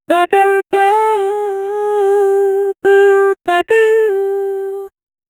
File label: house music vocal sample